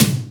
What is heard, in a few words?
JD800 Dance Card Drums